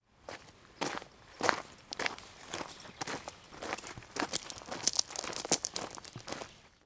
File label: Quiet Footsteps